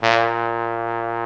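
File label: trombone A1; AKAI S-Series CD-ROM Sound Library VOL-8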